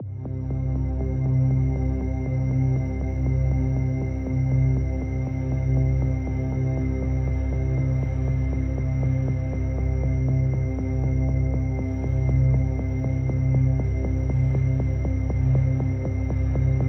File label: Fast Drone